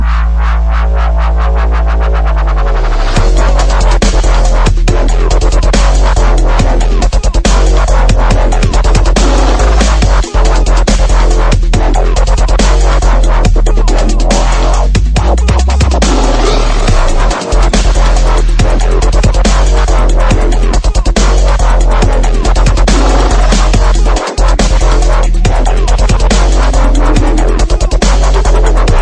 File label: Bass